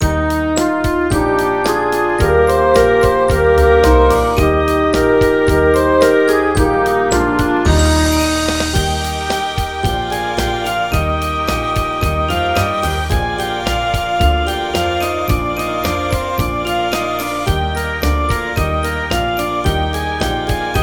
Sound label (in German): Lasst uns froh und munter sein Playback